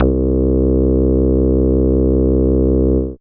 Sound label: Moog Bass, Dance Synths